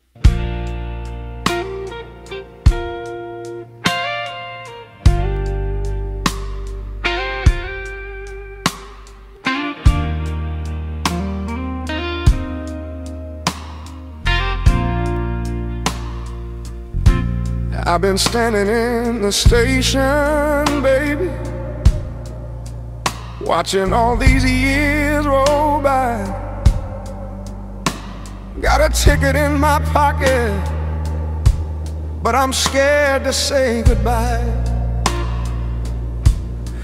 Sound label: Blues Ballads